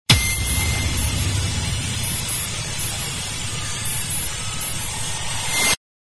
Galery Noise; Sound Ideas - Galery Noise 002

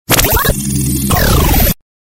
Galery Noise; Sound Ideas - Galery Noise 002

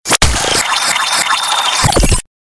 Galery Noise; Sound Ideas - Galery Noise 001

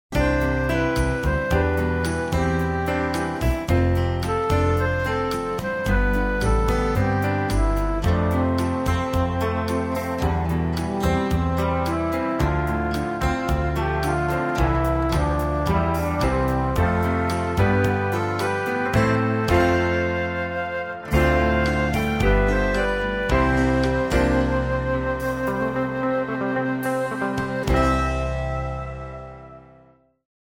Easy Listening & Classical; Sound Ideas

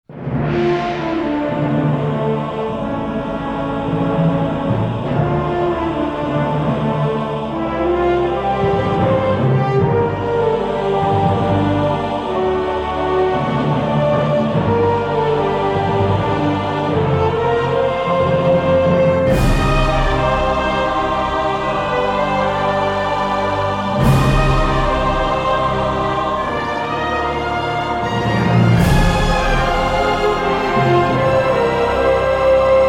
No Snare

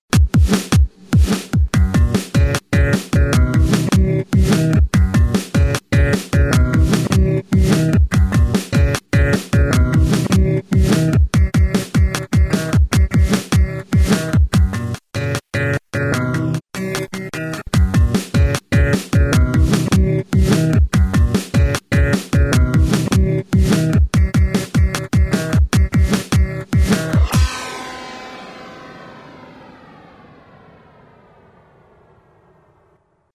bed acoustioso

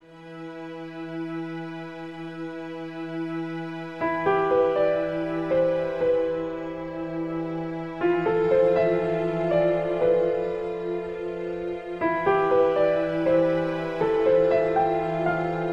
piano medly; Consolation

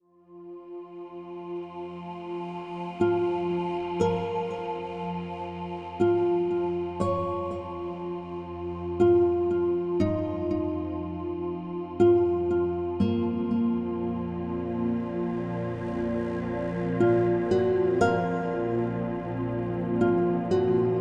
Intro, deep emotion, guitar pluck, strings

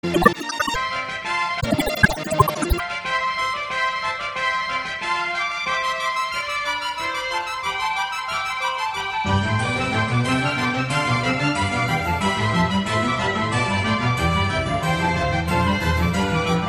Easy Listening & Classical; Sound Ideas

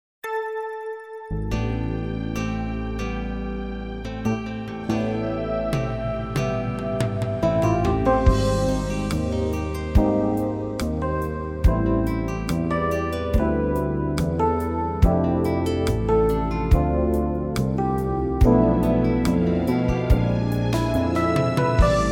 Easy Listening & Classical; Sound Ideas

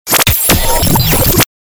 Galery Noise; Sound Ideas - Galery Noise 001

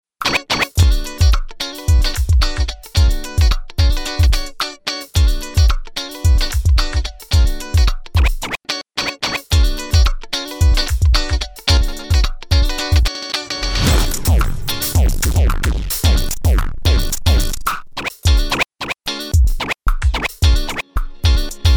scratch n thump guitar, bed

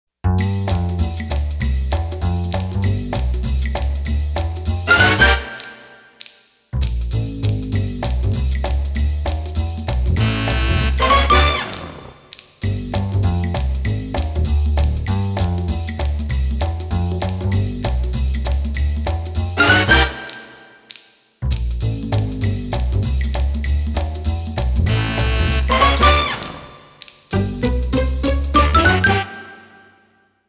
Comedy Style; Sound Ideas - Comedy Styles

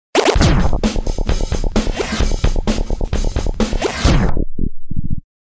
stretched

